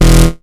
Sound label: Synth Hit E